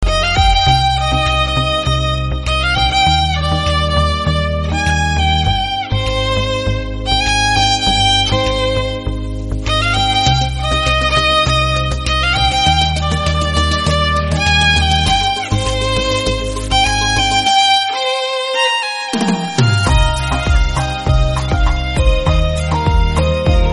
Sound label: Instrumental Ringtone